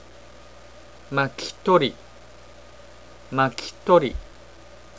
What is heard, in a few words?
firewood; japanese